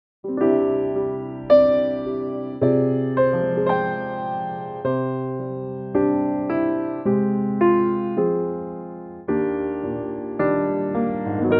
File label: Piano; key - C; vocal range - E to G; Disney; Cinderella